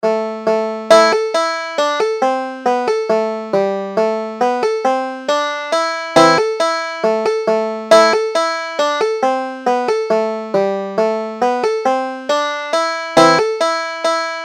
god rest ye merry gentlemen, banjo

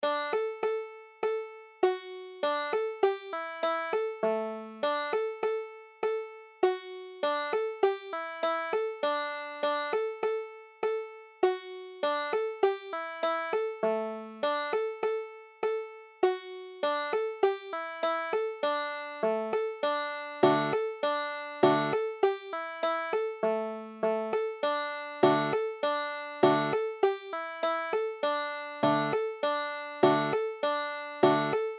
molly put the kettle on, banjo

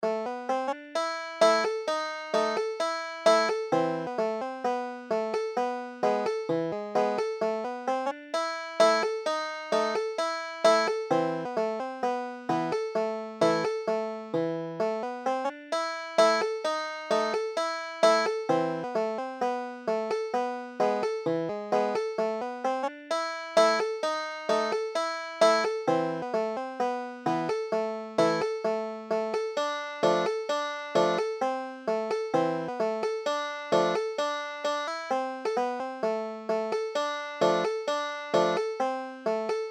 carpathian tune, banjo

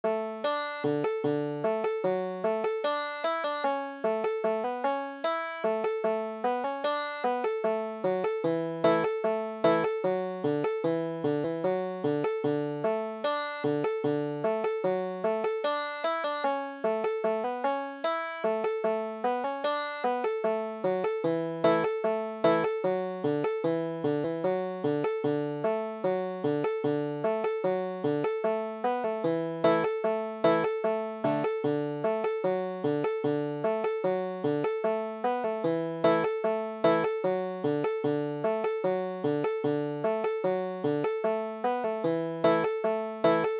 snouts and ears of america, banjo

